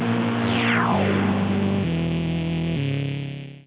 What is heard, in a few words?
Space Invader